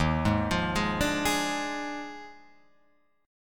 Eb Major 7th Suspended 2nd Suspended 4th down Guitar Open F; arpeggiated; EbM7sus2sus4